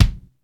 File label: DRY K, drum; Crypton - DrumBase5000; DRY KITS